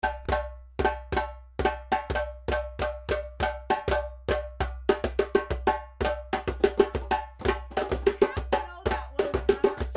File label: call; Kotedjuga